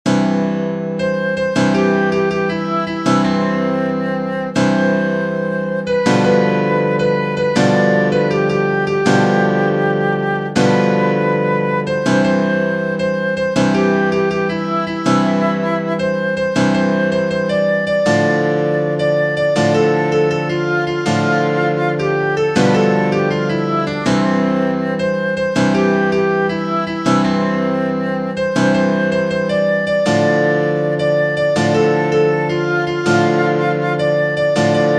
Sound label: Samba; Ariola